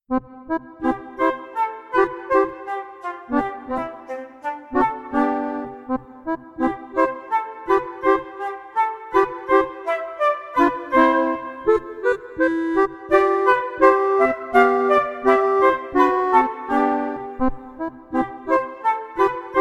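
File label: Rondino accordion flute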